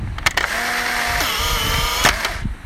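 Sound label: Polaroid sound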